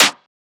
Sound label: clap hard; Drum Sample Pack; One Shots; Claps; Slate; SEISMIC